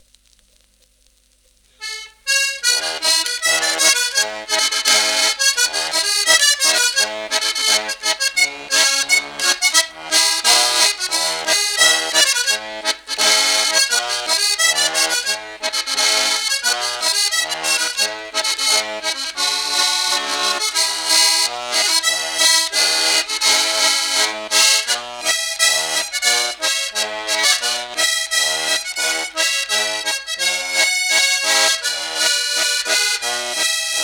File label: polka